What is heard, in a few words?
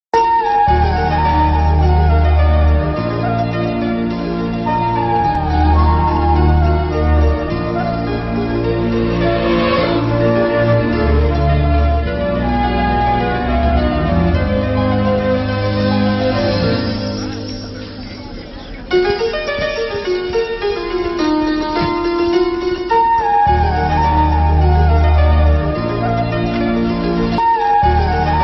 movie flute bgm; mrugaraju